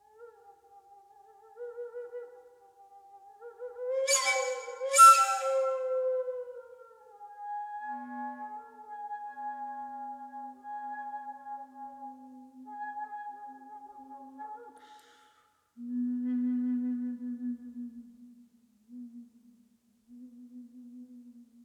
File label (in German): windserie